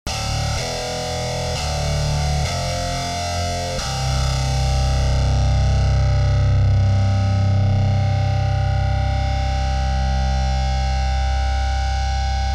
powermetal

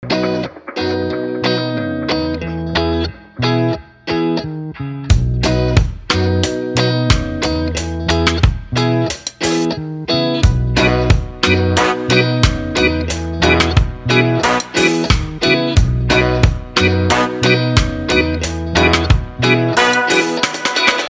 ragga

